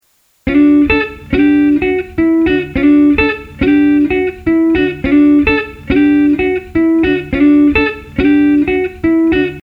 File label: Clean Electric Guitar; WES Audio Mimas